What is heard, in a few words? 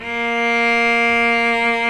A#2 SOLO CELLO